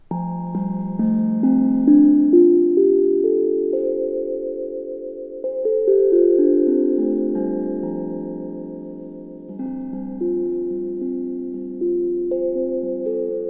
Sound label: Fa major pent 440